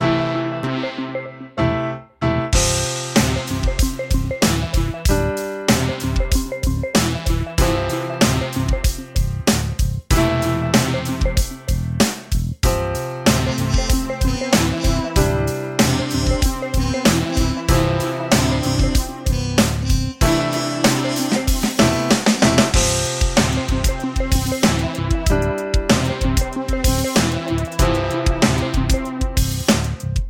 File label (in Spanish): instrumental; radio3 raportajes